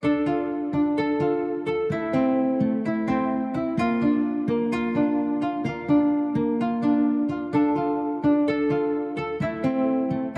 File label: 128 BPM D Min Guitar, loop stems; Cymatics; 2022 Melody Collection; Prayers